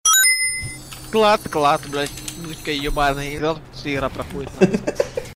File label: klats klats